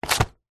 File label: household book telephone directory set down; zapsplat